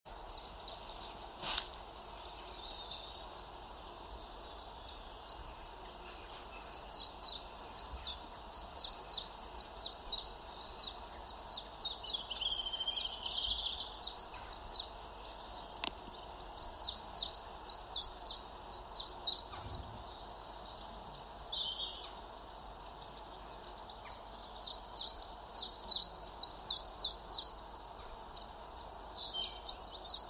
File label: Chifchaff and Robin